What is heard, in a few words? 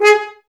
G#, Brass, F.Horns, Woodwind Brass Ensemble; Sample CDs; Miroslav Vitous